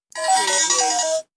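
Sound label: Electric Beep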